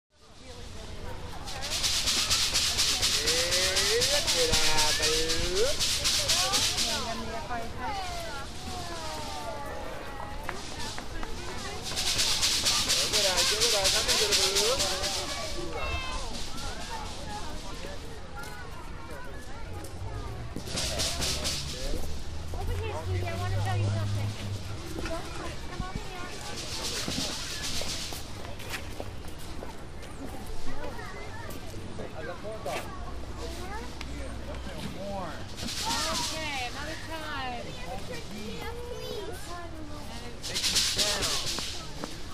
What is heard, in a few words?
Balloon Vendor Park C